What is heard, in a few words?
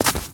Footstep Snow Run